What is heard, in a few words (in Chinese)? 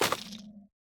幽匿脉络破坏声